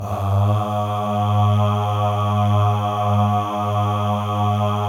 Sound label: MALE AHS; Spectrasonics; Symphony of Voices